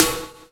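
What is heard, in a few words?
166 BREAK snare drum, Explosive Jungle; USB Soundscan vol.05; AKAI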